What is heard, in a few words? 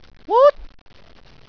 woot